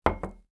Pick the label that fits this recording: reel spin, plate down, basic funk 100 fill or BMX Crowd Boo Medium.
plate down